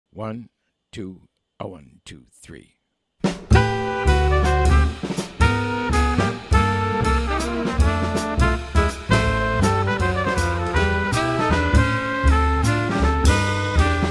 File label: minus Piano